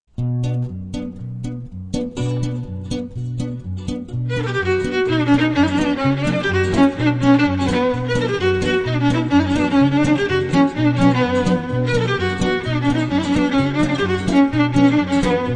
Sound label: romanian gypsy melody